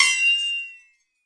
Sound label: Processed Ethn Perc, ETHNIC and WORLD PERCUSSION